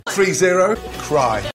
cry

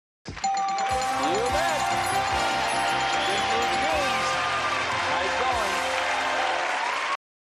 win chain

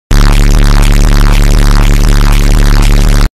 bass boost sound effect

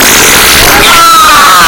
man scream

